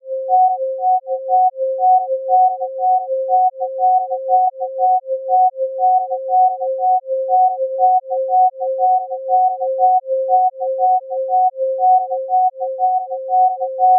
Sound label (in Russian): trevoga 528 gc i 741 gc takzhe izvestnaya kak interval dyavola